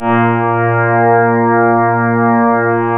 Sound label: ORGAN, A#; Big Fish Audio; Synclav FM